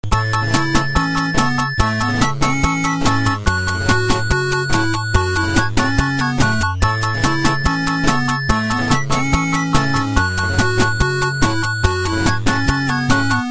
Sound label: 8-bit